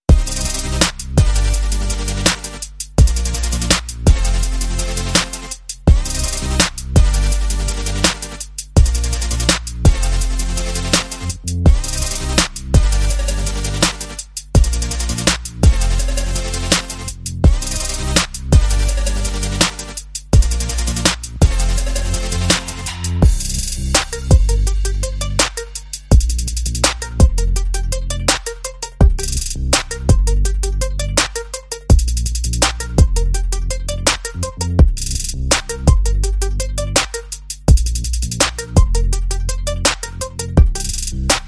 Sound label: No Vocal FX